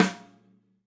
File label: Snare Rimshot Softest, hydrogen drum samples